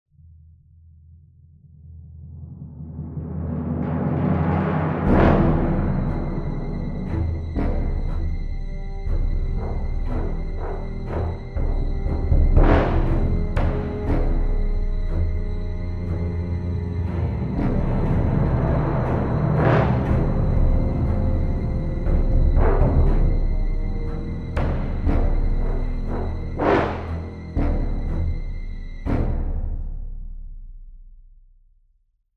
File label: Loop Tense